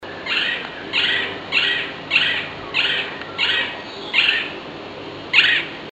golden naped woodpecker

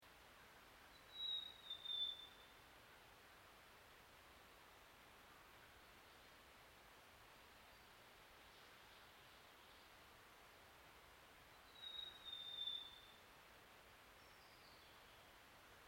swynnertons robin

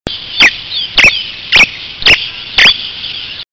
diuca finch

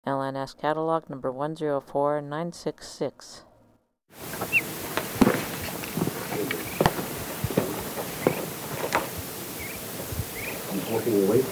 desert lark

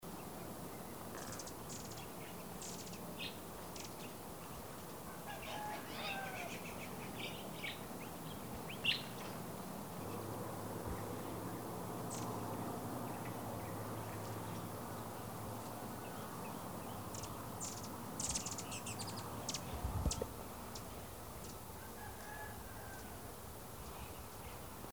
beautiful hummingbird

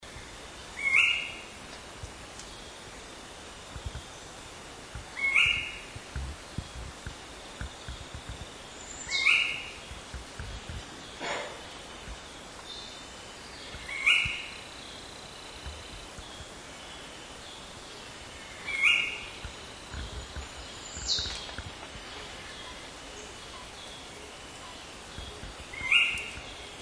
brehms tiger parrot

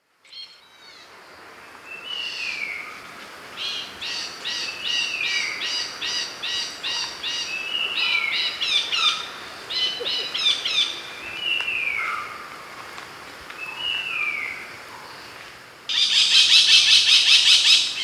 layards parakeet

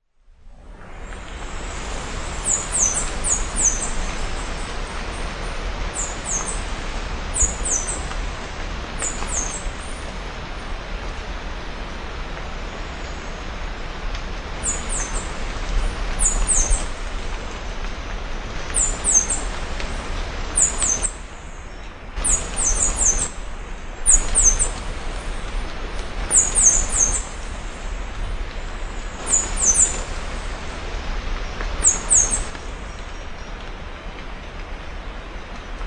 olive finch

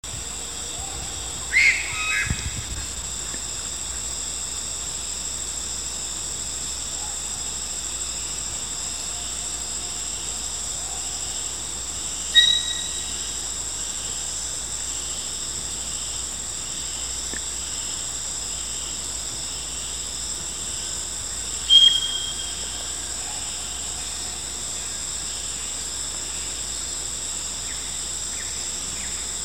black headed parrot

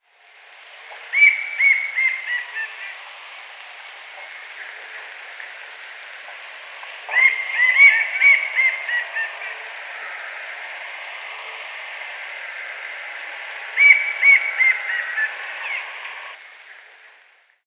sombre kingfisher

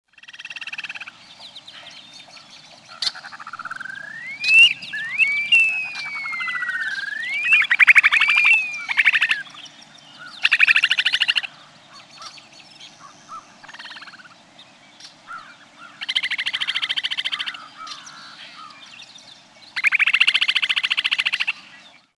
upland sandpiper

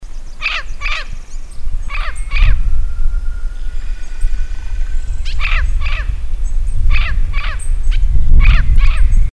vinaceous parrot

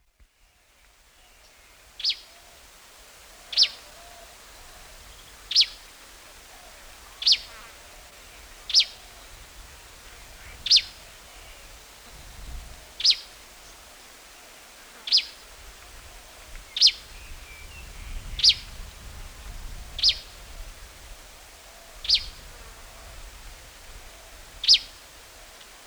plain backed sparrow

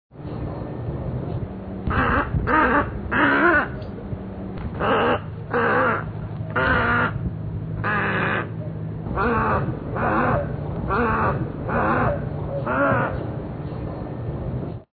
tricoloured heron

